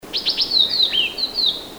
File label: Yellow Tit; Macholophus holsti; song